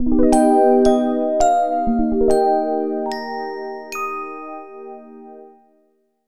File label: Jingle Goodnight; Harvest Moon The Lost Valley; 3DS